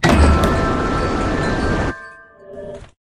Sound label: Windy Door Open; Lethal Company